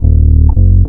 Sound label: IPON BASS; Big Beat Samples & More